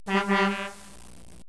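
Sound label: TRAFFIC, car sounds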